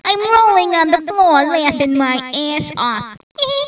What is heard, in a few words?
roflmao; Oneliners